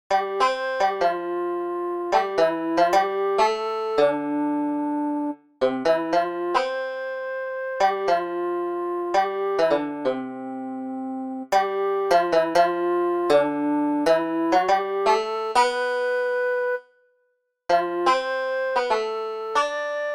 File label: Banjo + Tin Whistle; Lift High the cross